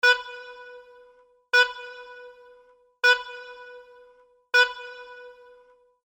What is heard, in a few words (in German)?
Oboe; vermittlung neue musik